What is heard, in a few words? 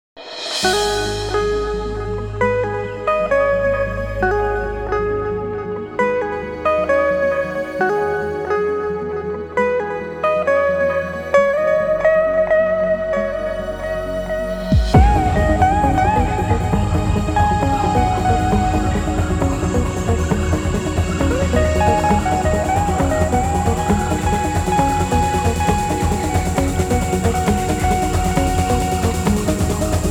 Instrumental Radio Mix